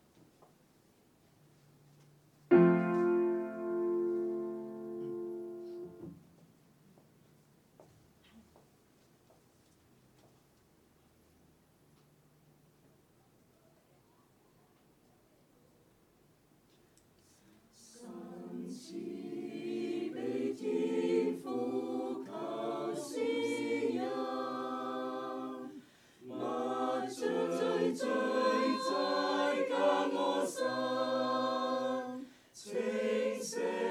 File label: choir; worship